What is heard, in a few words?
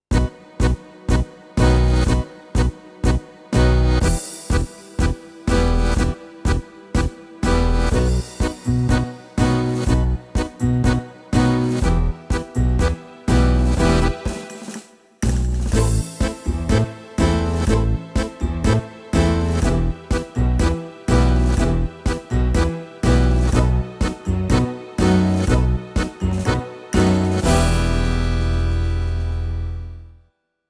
style ballroom tango, yamaha tyros3